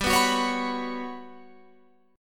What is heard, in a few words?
G Major 7th Flat 5th, Guitar Open F; GM7b5; strummed